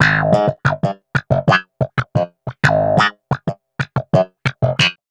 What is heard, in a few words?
Descending Wah Snap, Snap Bass; Basses